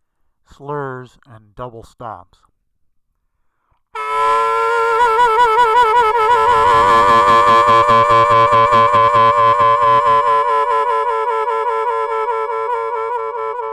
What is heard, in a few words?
Slurs Double Stops